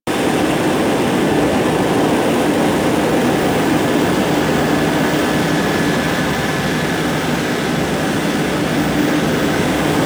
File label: Archwing movement idle loop; Warframe